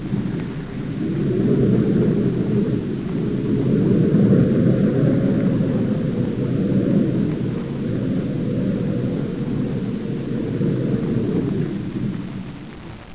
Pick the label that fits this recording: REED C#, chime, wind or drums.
wind